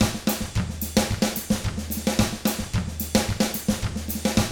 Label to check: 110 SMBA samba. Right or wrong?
Right